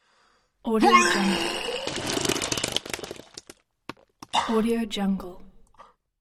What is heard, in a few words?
vomiting